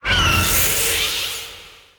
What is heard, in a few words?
dragon start